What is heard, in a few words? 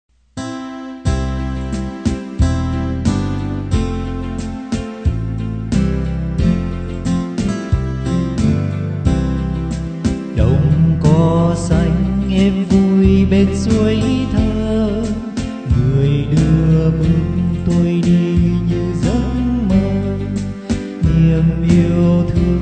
BASS